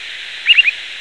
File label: upland sandpiper